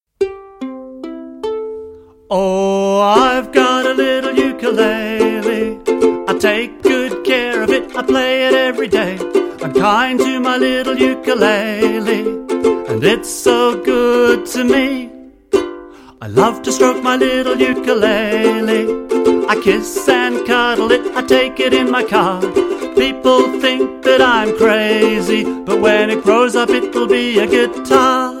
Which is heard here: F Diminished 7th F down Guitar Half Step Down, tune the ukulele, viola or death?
tune the ukulele